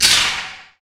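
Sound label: PER PROCU, Procussion, percussion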